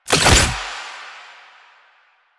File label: Fuel Projectile Drop; JadeShadows; Ascension